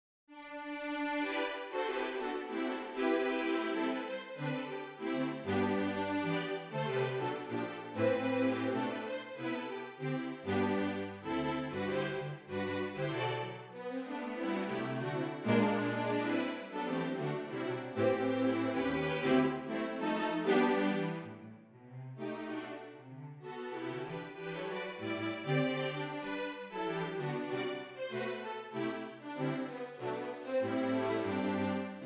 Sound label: Deck the Halls string quartet